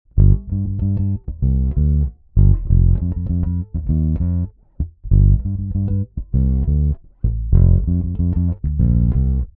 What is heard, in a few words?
Neck Bassboost Active Hi Cut